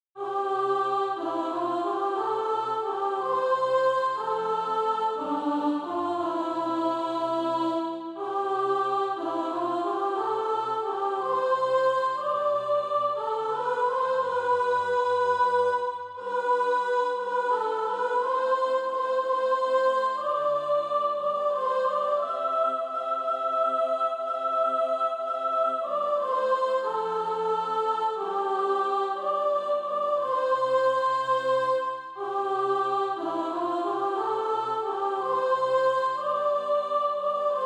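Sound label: soprano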